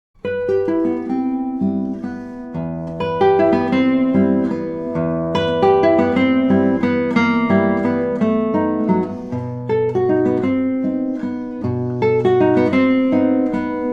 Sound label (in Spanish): el guitarrista